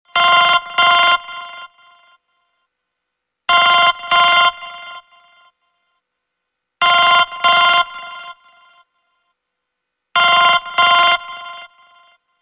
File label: Slow Ringer 3D